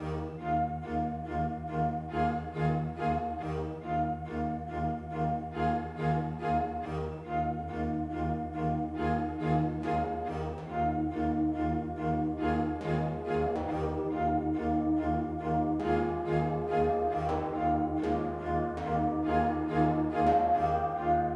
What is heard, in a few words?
winter loop beats; Hörspiel- und Feature-Musik